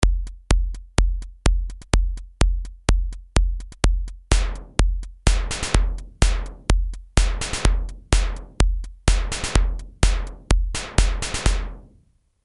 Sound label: Pro One drums